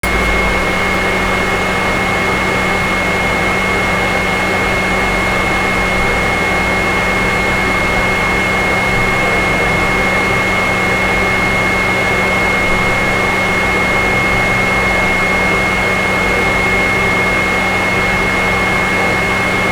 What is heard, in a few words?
Playstation; SFX; IRL Recorded